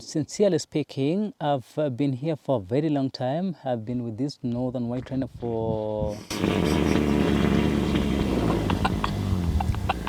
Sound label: RHINO EXPLOSION